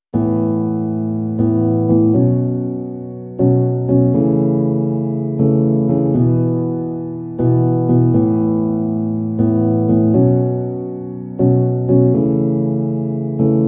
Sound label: Rain, PIANO